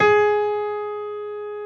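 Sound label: piano G#3, GRAND; S-GRAND PF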